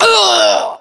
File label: death